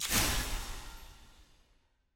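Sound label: end of game ui master burst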